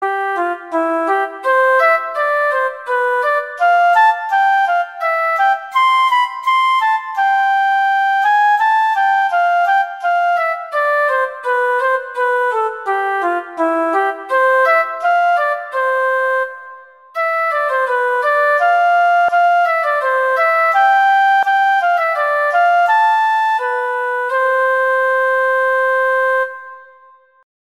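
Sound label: Minuet, cello; Very Easy Collection; Mozart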